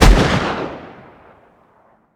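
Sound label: weapon pistol mag fire player atmo ext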